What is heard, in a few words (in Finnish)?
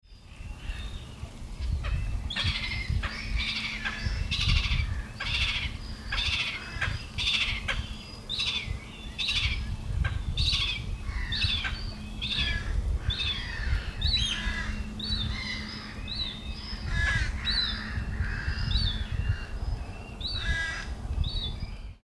naurulokin kutsu; linnut